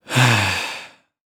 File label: Human Deep Sigh